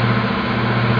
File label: CAR SLOW